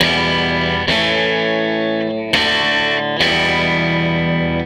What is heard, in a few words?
Strat Guitar Loop